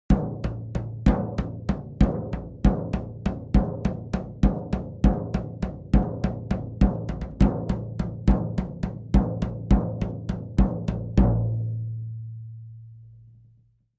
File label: Drums Toms Rhythm, drums tom